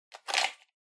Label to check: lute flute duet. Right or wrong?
Wrong